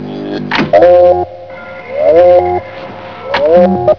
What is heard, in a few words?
alarm warble